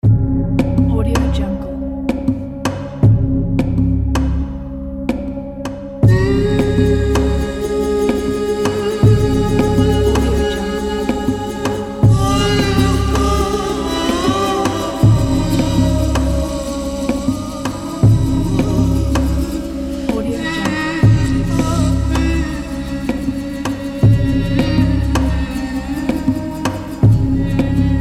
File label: arabic cinematic